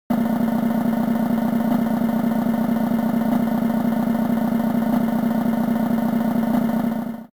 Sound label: Drum SD Roll; WarioWare Inc